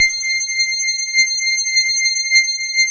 DS8 C 6, Korg

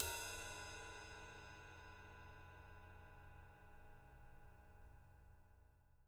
JZ RIDES, Living Drums

